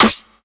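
sparkly steel snare drum sound a key, tuned to the A note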